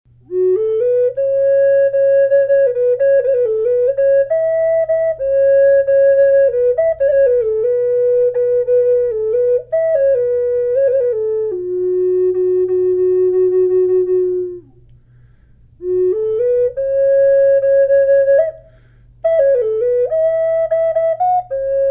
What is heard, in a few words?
black wolf f sharp, flutes; collector flutes